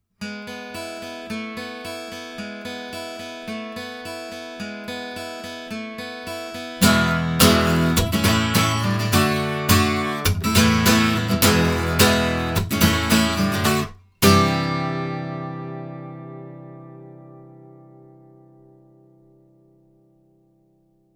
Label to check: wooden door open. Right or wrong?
Wrong